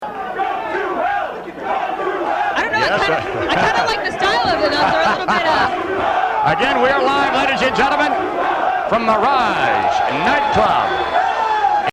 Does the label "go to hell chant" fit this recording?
Yes